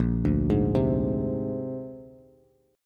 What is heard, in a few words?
A sharp 9th A sharp Bass; arpeggiated; JGuitar